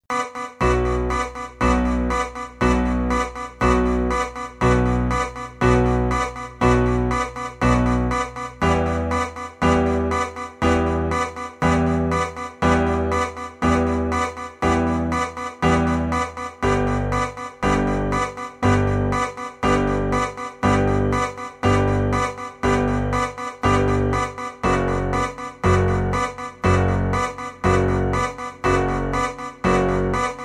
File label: minimal